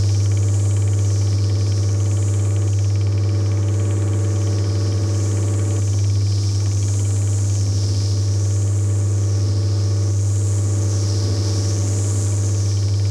fusion generator, factorio sound entity fusion

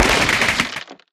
mined tree; mining; yumako; Factorio

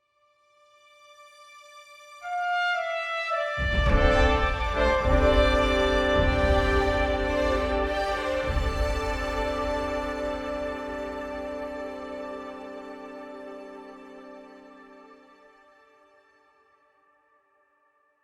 FTL Jump Melodies; Helldivers 2

